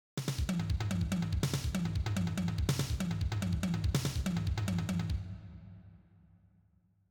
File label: triplets